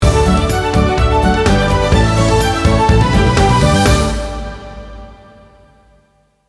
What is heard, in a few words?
Jingle; Burgfest